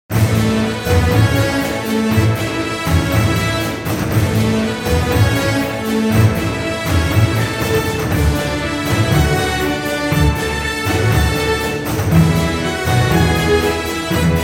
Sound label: Brave parade, Background Music